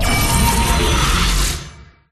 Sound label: Powercard Revive Start; clubpenguin; cjsnow